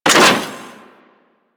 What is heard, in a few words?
Venus Research Facil Target Arm Stop; Objective Rooms; Props One Offs